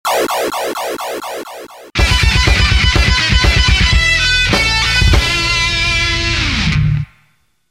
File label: Castlevania Dead, 8bit